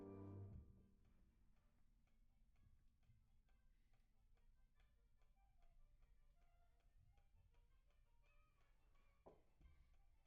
PIANO

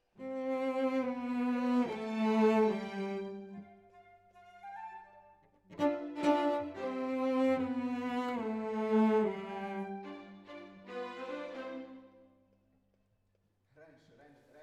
Cello Solo

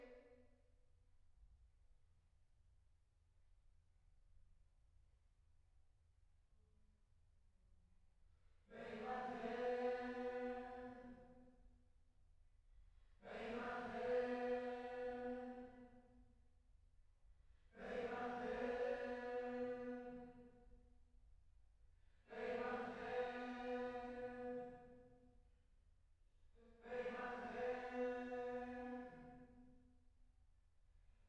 Choir

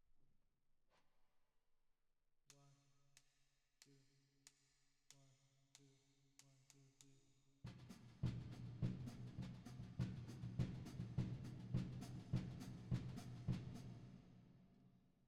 Drums Verb

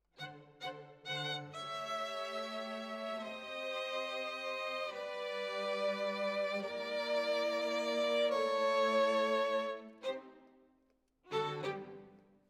Violin Solo

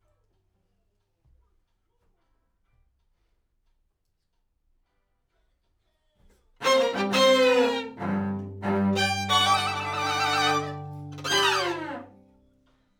Main Strings

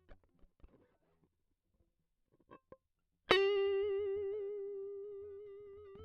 Guit Solo Line, RNR for piano

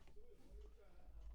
Kit

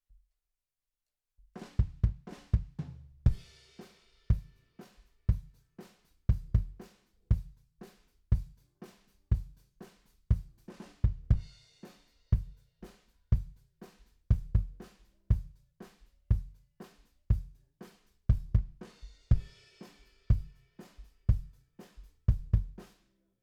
BD OUT

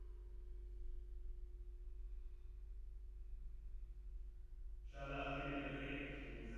Rev

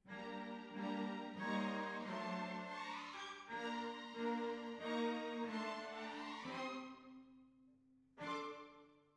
Cbasses

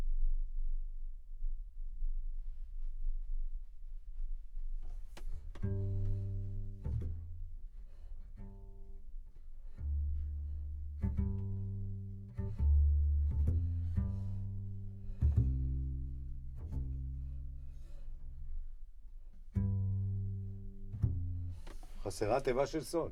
Bass Bot

